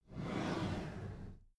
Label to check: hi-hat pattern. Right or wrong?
Wrong